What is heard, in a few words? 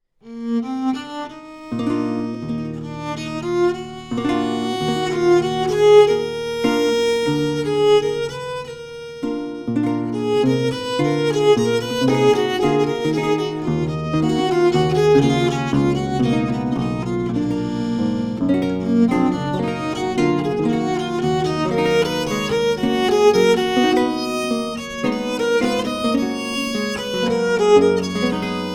Andante Adagio Tempo di Gavotta; Ariosti